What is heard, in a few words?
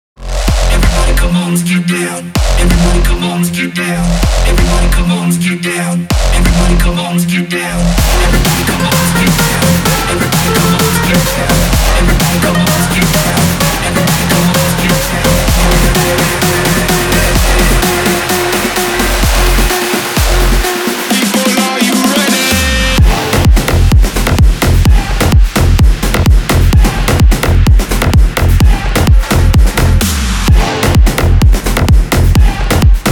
Chaos of Drum; DANCERUSH STARDOM